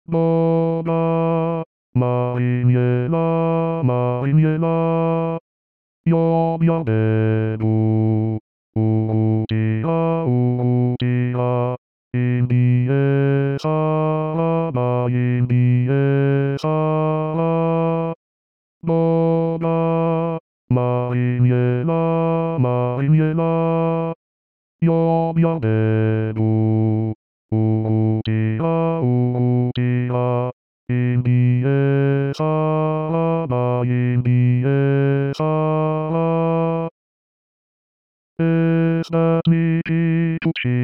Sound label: Boga basse bas